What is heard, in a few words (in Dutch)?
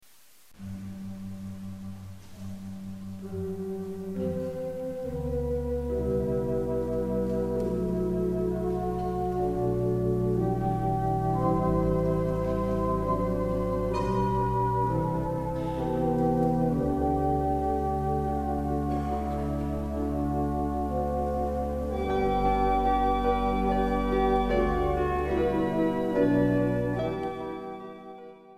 Instrumentaal Intermezzo Psalm 134